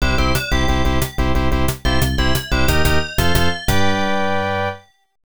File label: Fanfare; Don Den Lover Vol. 1 Shiro Kuro Tsukeyo; arcade